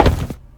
land heavy; casualtiesunknown

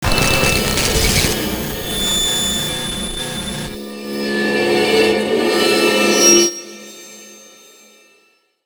cut eye; casualtiesunknown

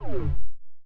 mood down; casualtiesunknown

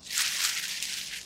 bush; casualtiesunknown

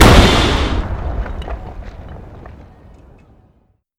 explosion; casualtiesunknown

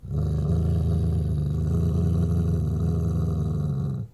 growl; casualtiesunknown

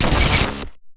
cut; casualtiesunknown

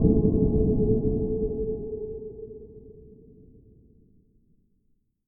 sonar ouch blocked; casualtiesunknown

